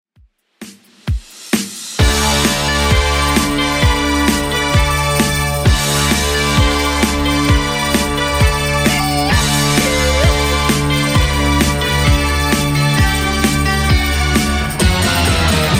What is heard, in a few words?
80s Redrum 131bpm